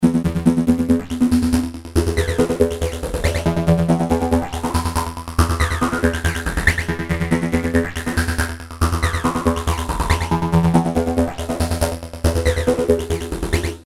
Un-Buzzy Bee, Synths; Industrial

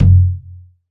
Ritual Kick, drum machines

